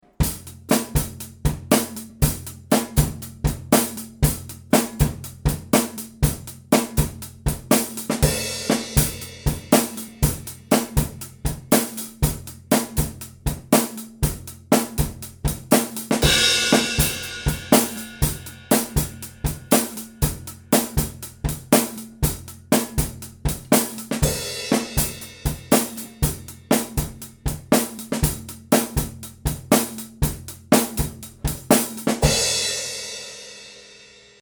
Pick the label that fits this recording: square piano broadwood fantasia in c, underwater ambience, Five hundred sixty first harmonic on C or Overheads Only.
Overheads Only